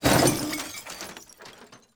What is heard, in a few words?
impact debris fall